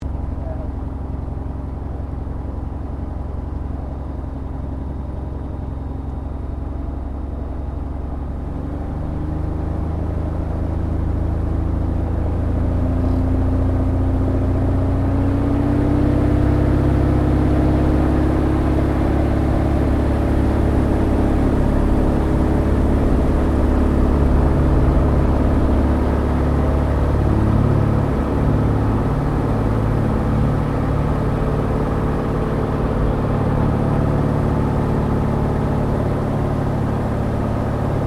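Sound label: AIRCRAFT CESSNA O-1 BIRDDOG TAKEOFF INTERIOR, spotter aircraft; Airplanes (Sound Effects:Vehicles)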